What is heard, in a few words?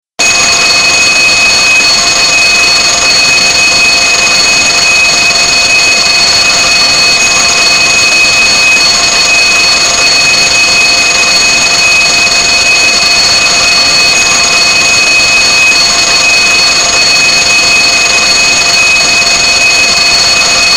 firebell classic